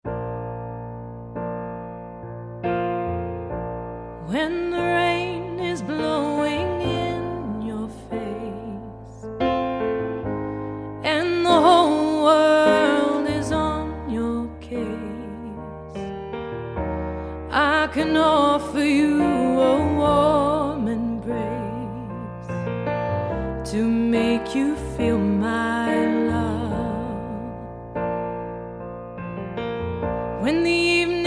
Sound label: funky gospel choir